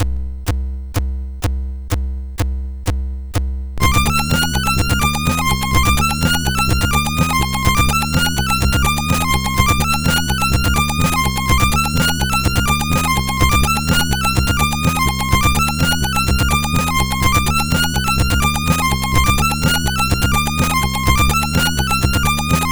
Techno Style, sid MOS6581R4

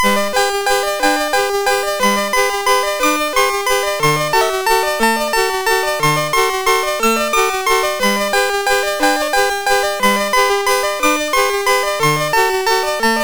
sid MOS6581R4, GAMES; Accolades Comics

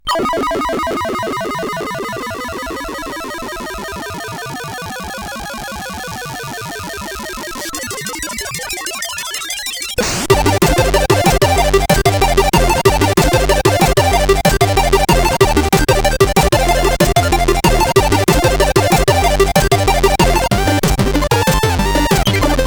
Random Waltz, sid, CSG8580R5

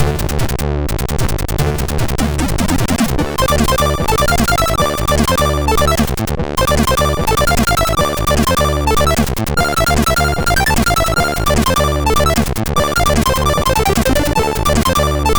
Opening sid MOS6581R3; Street Fighter II; hvsc

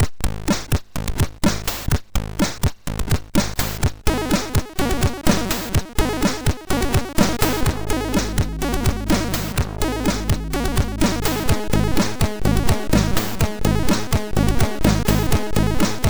Hip Hop Land, sid, MOS6581R4

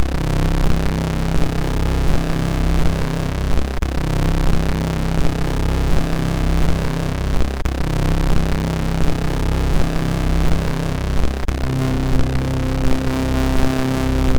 Fly Bird Fly, MOS6581R3; sid

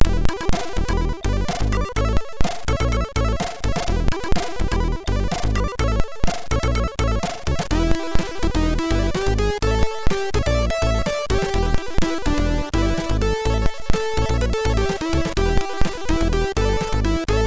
Wild Land, sid MOS6581R2; Spike

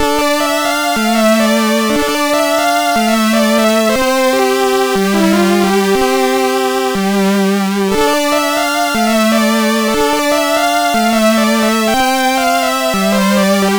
sid MOS6581R2

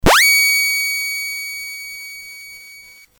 sid CSG8580R5, GAMES; Crossword

